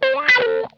COARSE LICK, Funk Guitar; Zero G - Funk Guitar

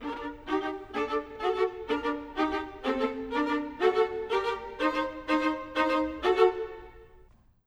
Violas, Orchestral

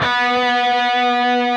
PRS C, Guitars, LEAD; Spectrasonics; Hans Zimmer Guitars VOL-1

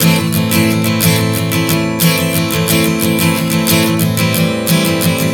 Strum 090 Am, One Chord Strumming 090 bpm; Acoustic Guitar

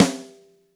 Gretsch Snare; 1967 Gretsch Kit; One-Shots; Sonic Foundry (Sony Creative Software); Vital Drums

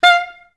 STAC F4, Orchestra; Best Service; Peter Siedlaczek's Advanced Orchestra

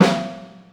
Snare Live, Lounge Single Drum Hitz

